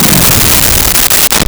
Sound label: Radio Hit Descent; Transitional Elements; 1001 Sound Effects